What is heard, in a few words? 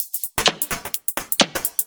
Electro Loop 128BPM; Vengeance Samples